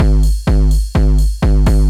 loop, 125; Sound & Vision - Gigapack I

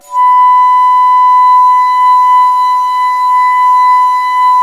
BASS, bass flute; USB Soundscan vol.23

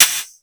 MONDO PERC, METALIC DRM; Crypton - DrumBase5000; METALIC DRM2

